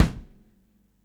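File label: FX bass drum, Drums Acoustic; FX KIT; USB Soundscan vol.10; AKAI; 90s sample CD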